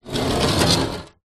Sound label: wood drag scrape on rusty metal table